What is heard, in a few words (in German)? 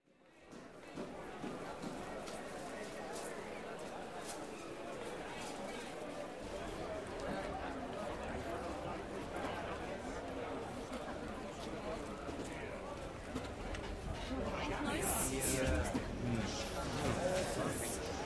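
Geräusch Stadt mit Menschen und Zwischenrufen; Lystra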